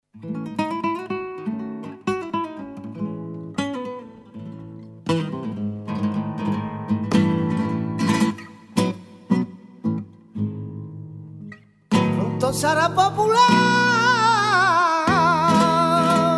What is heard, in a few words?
Fandango de Almeria, flamenco